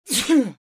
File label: Young boy sneeze